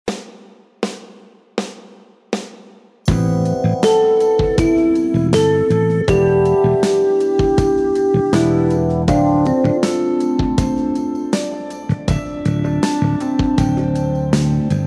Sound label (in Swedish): Amoll ballad